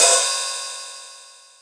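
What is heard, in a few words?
Open Hihat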